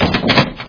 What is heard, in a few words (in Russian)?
tyazhelaya zheleznaya shekolda